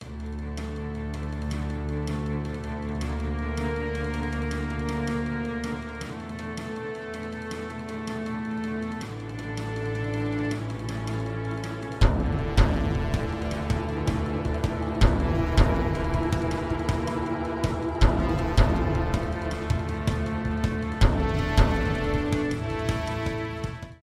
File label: Cinematic Music Short